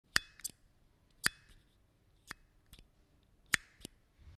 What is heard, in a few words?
Paper clip removal